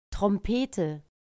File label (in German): trompete